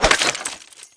ammo big; svencoop; aliens items

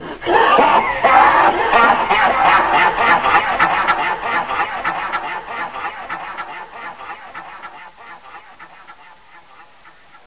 weird laugh; svencoop; demonprey

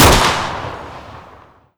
oicw shoot, weapons; svencoop

